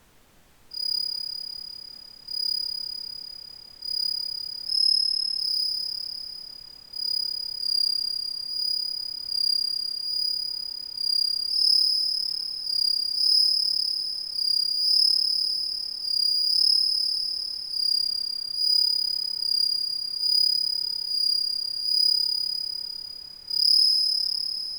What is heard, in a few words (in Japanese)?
suzumushi